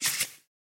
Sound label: dealing sound